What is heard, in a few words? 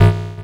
Buttons Mechanical; Adobe; Multimedia Internet CD-Rom Flash